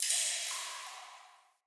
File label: Cave Ocean Drip